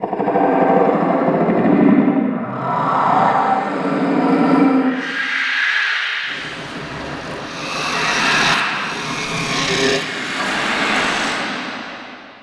inside battle antlion; Canals

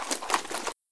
m203 shell, weapons; sci arms

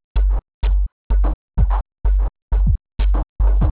hihat low

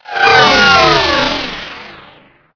race die

